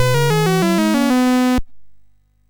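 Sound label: Number Tumbler, MOS 6581 SID chip music, games; hvsc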